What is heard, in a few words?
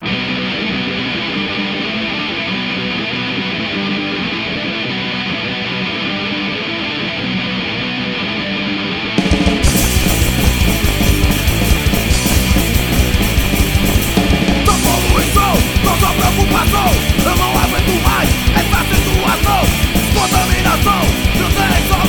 PUNK HC